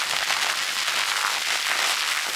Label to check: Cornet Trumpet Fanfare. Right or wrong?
Wrong